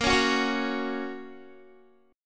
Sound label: Bb Minor Sharp 5th Bb down Guitar; Bbm#5 strummed